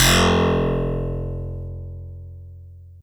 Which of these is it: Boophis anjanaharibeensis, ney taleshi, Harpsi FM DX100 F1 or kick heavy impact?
Harpsi FM DX100 F1